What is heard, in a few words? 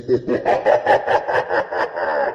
evil laugh meme addition